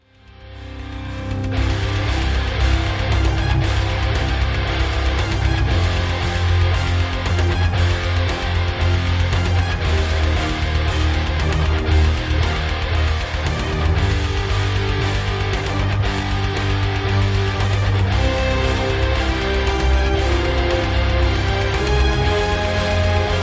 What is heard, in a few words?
Rock Music Alarm Ringtone; ringtones